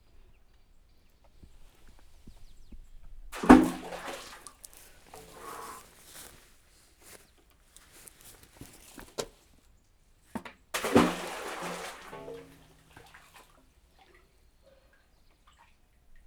két kő lyukon át vízbe ejtése, fedetlen kút; Varbóc

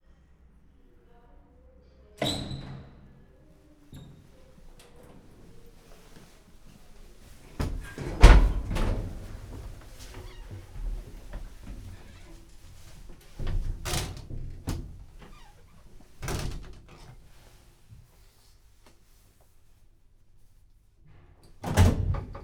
fel és le, lift; angyalfold

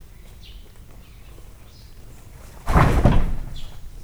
száját csattogtatja, láma; miskolci zoo 2009

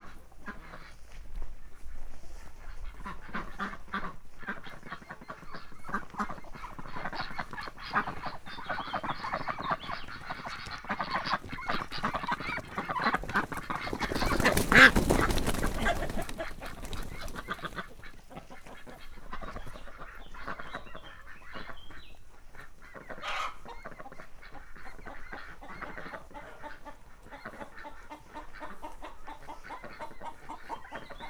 kacsa megzavarása; állathangok; paraszt udvar

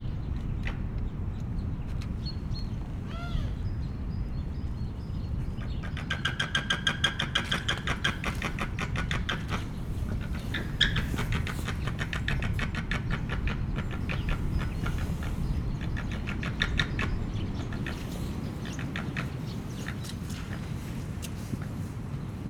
szeles idő, rétisas; Hortobágyi Nemzeti Park

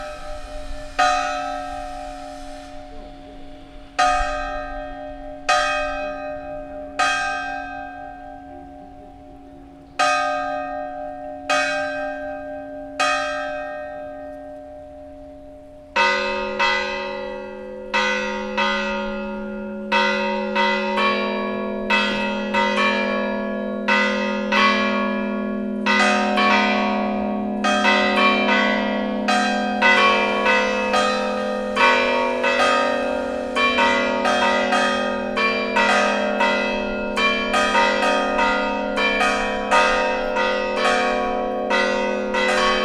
templom deli harangszo; sanfrancisco templom

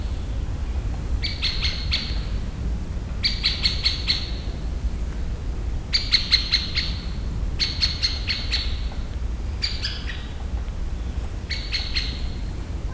nagy fakopáncs, Visegrádi-hegység; termeszetben

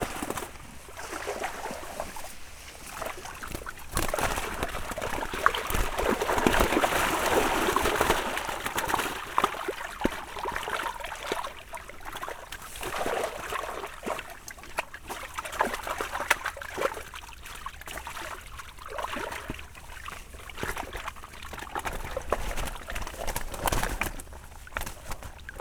szakban esszarazon, ponty; termeszetben; recsk

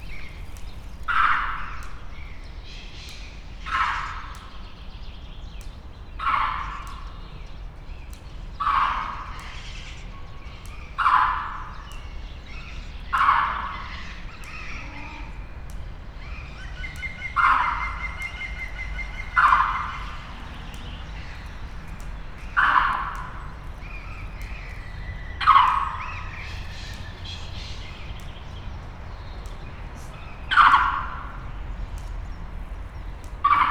egyszer pápaszemes pingvin is hallható, aranyhasú mangábé; debreceni zoo 2012

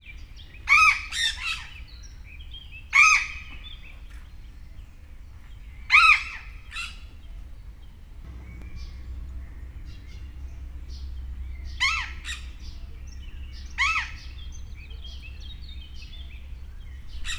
csendesebb környezet, sziklapapagáj; állathangok; miskolci zoo 2009

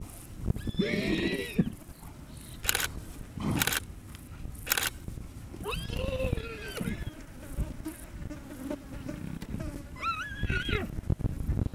fényképezés is, vadló; Hortobágyi Nemzeti Park; pentazug

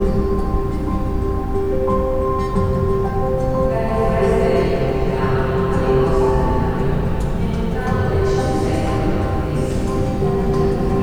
kettere mzeneiosszhangzasa archeologiai muzeum

